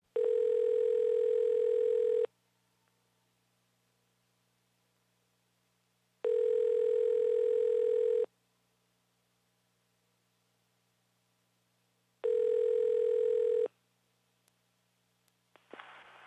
Answering Machine; Answering machines Futz; Answering machine, cassette type, in-line ring, answer, beeps

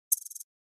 Flash Gear HF Elect Pu, flash gear

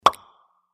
Bubble Pop High Dry; bubbles

